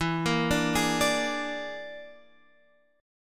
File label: Bb 7th Flat 5th E